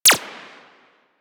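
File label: machine gun tech; Weapons; Warframe; Archwing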